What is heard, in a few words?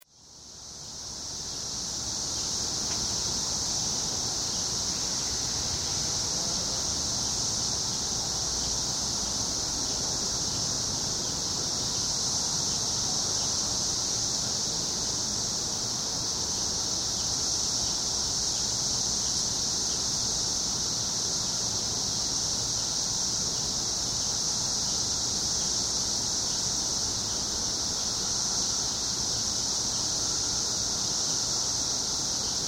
cicadas, 17yr cicada; Excerpts of the 17-year Cicada Brood XIII from Glenview, IL (2007)